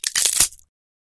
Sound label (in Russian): zvuk pereloma kostey